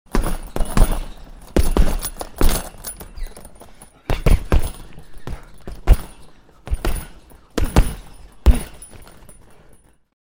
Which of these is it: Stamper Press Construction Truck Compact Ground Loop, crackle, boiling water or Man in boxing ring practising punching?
Man in boxing ring practising punching